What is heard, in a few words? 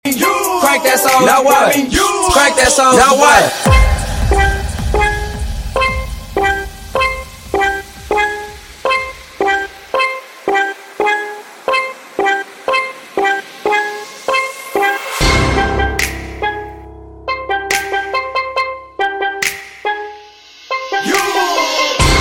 hip hop intro bpm 70 - 140